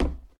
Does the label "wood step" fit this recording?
Yes